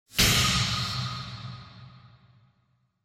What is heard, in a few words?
Onoma Impact Dry